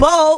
bowl

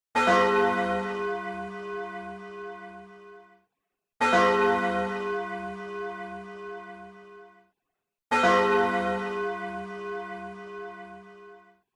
clocktower

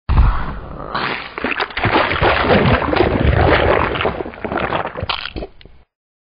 splat

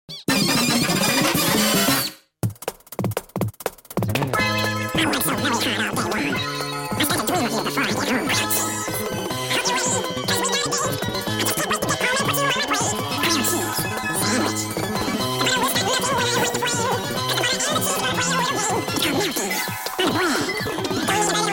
grilled cheese

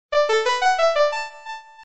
pickup

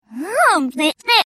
clock, reverse

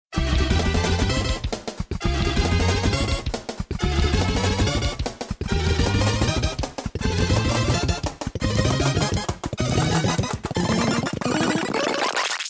course clear

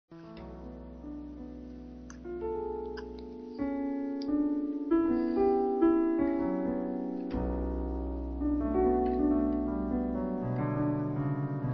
piano

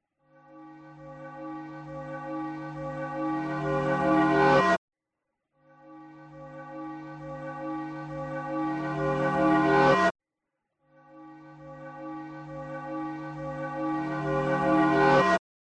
clocktower, reverse

